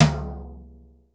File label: DRY T2; Crypton - DrumBase5000; R70 KIT #1